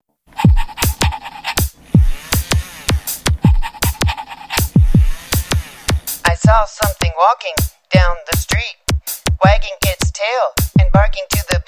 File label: Robotical Dog